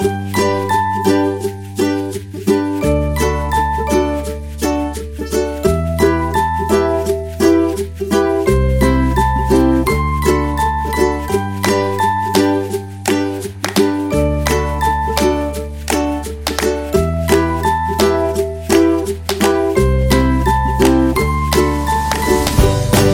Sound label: Calm Inspiring Folk, happy music; happy music vol 2